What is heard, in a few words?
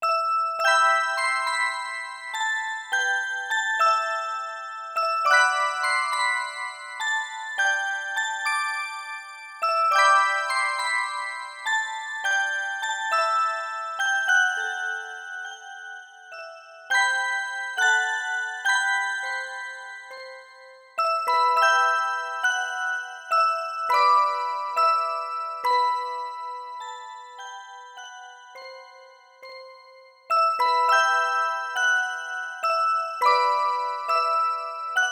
orgel ver